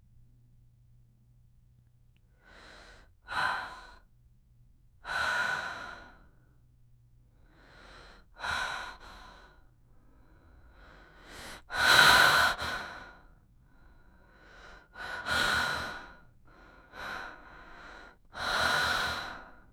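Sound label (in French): respiration douce régulière; regular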